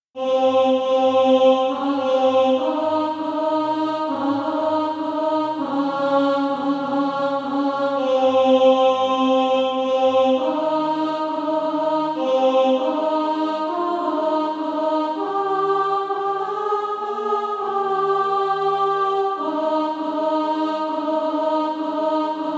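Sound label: alto, Practise Music; SATB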